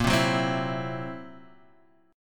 C 7th Flat 5th A sharp, chord

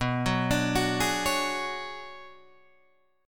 B Minor 6th Add 9th B, Guitar Open D

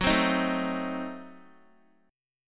Gb Diminished 7th, down, Guitar; Gbdim7; strummed

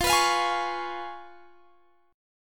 C sharp 7th F, chord

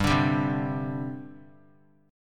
G Suspended 4th; Gsus4 strummed; JGuitar

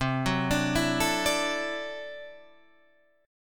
D-Major Add 9th, C, Guitar, Standard; arpeggiated

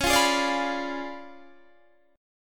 Db 7th Flat 9th, Guitar, Standard; Db7b9 strummed; JGuitar

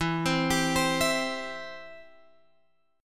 E Minor Sharp 5th E down Guitar Drop D

